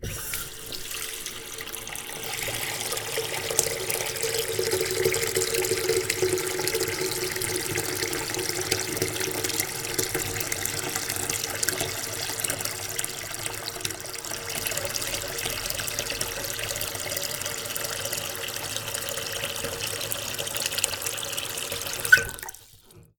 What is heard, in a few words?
filling washbasin; 洗面台に水を貯める; wetarea